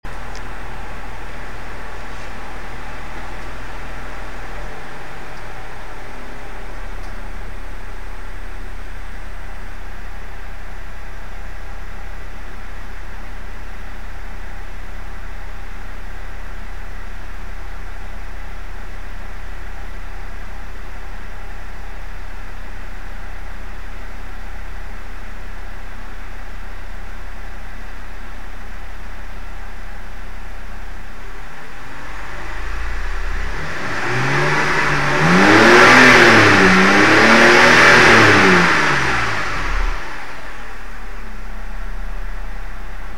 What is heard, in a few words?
bmw 630i rev; 6-series